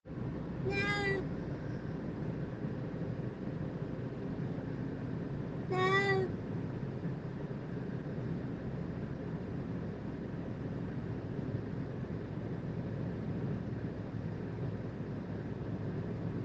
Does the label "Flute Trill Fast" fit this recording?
No